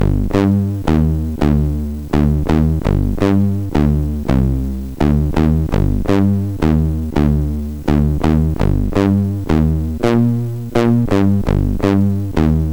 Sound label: Bass, MOS6581; sid